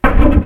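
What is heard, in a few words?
Collision Interior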